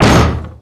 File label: hit kick metal door; doors